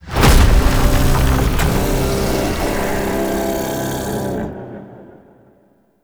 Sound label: Mirror Defense Rune Count Threshold; Warframe; Entrati